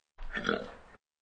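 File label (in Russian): храп лошади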